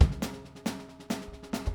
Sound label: 137 Zydeco Swamp Drums, Very Fast Tempo; Pop Rock; Pro Tools Essential Loops; Digidesign